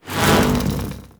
Hoverboard Slide Start A; Vehicles; Warframe; Venus Hoverboard

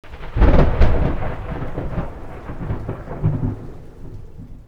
Venus Landscape Thunder Crack, Ambience; Warframe; Venus Open World

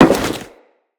Crewman Step Default, Footsteps; Enemies; Corpus

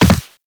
Land Soft Default, Footsteps; Characters; Operator

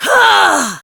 Throw, Barks; Kuva Lich; Persona B; Ability

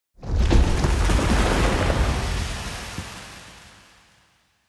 Stone Cliff Door Dissolve A

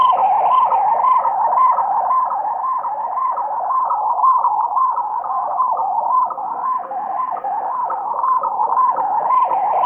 Ramsled Engine Loop; Grineer; Grineer Ram Sled Agent

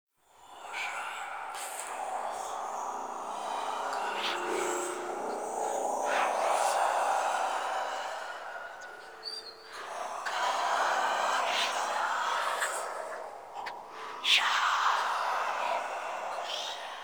Whispers; Muruk Vull; Warframe; Ambience; Entrati

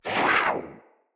Kavat Vocal Attack Short; Warframes; Khora

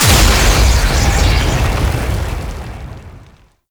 Crystal Explosion; Gameplay; Devourer; Warframe; Veilbreaker; Devourer Kahl

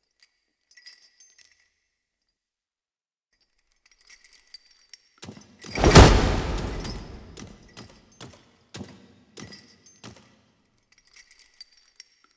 Movement C; The New War; Ballas; Cinematics

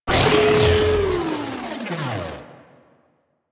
Mutalist Death, Vocals; Warframe; Proto Infested

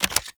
Auto Crossbow Reload Clip Out

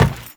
step metal, footsteps; Warframe; Teshin

